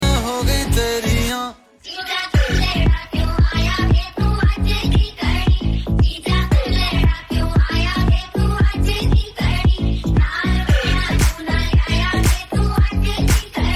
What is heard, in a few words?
Ringtone